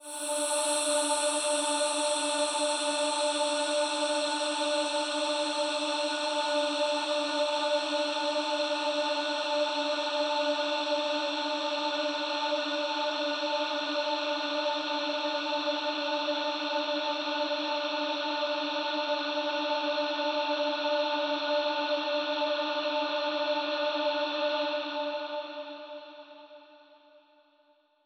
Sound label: Choral Tonal Ambience; Cymatics